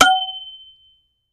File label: Impact Vari Metal, crashes